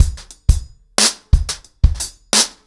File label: 90BPM, acoustic drums, drumloops; The Roots Drumloops